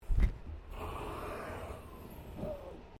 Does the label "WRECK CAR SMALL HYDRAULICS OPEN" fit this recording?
Yes